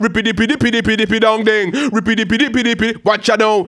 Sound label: HAL RAGGAM, RAGGA-MUFF A maj